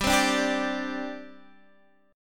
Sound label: C Suspended 2nd G